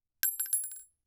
Weapon Bullet 3030 Rifle Shell Drop; AdobeCC

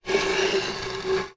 Wood Chair Slide Across Wood Floor, Household

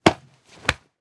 Impact Human Grab; Impacts

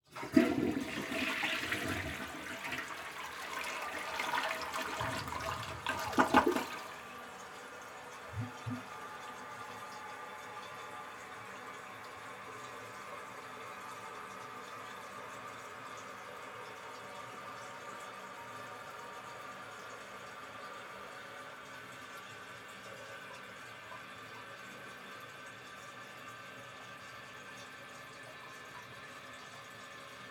Toilet Flush Lid Up, Household

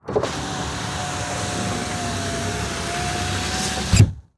Truck GM Envoy Close Window Interior; transportation

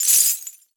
Crash Glass Debris Pouring Out On Concrete Short; Crashes

